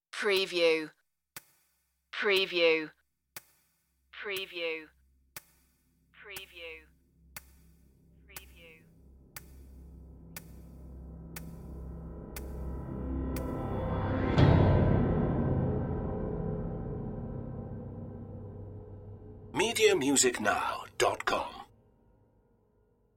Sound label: tick tock tension